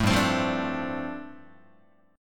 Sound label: G 7th Suspended 2nd Suspended 4th G chord, Guitar; G7sus2sus4 strummed